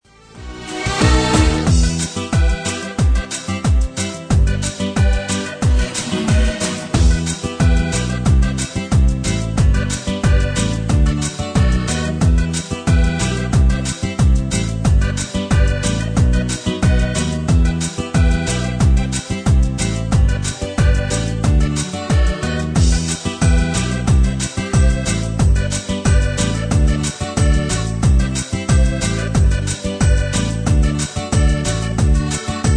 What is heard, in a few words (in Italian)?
Orchestra Piva